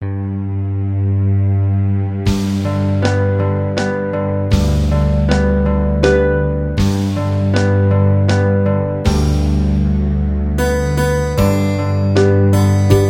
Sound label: Lullaby, harmonica